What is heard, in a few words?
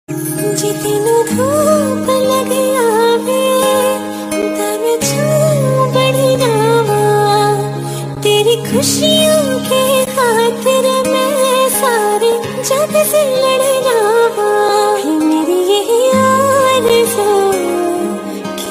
Female Version Song Ringtone